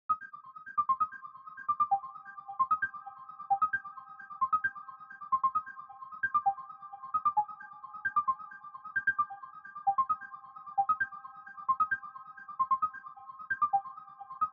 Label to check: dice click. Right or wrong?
Wrong